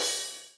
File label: soft hitfinish